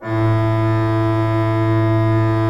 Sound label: HGRP A1, Cathedral Organ, HOLZGEDACKT; Propeller Island